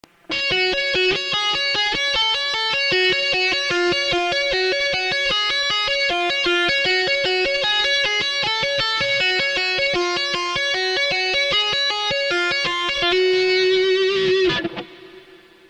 tap

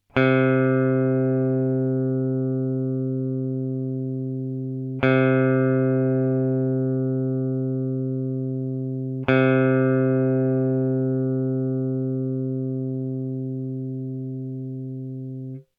drop c; Drop tuning your guitar is simply where you tune the bottom string

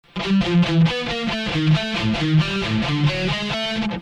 thrash lead b

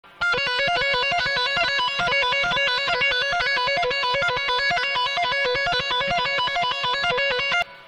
tap

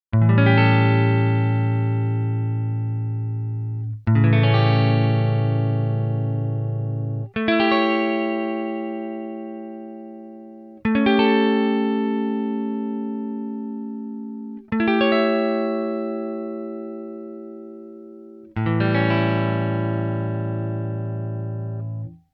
a7 shapes

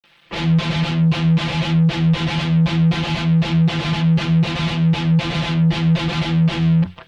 thrash

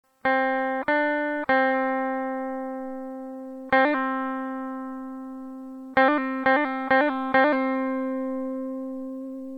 hammer pull b

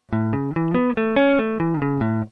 arp

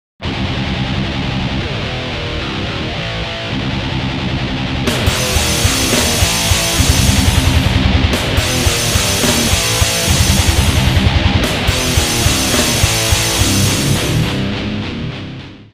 death metal

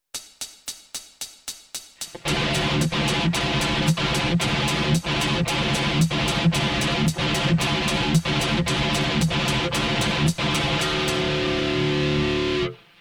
thrash mix

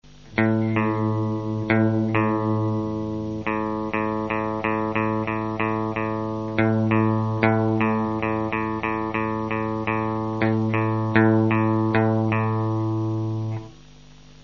tuning

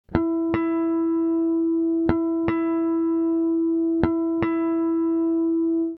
e a harmonic

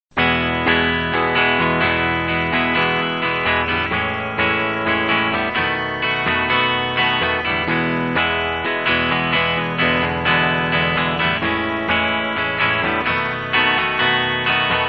i iv v prog

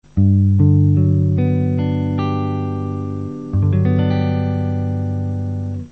gmaj7 barre

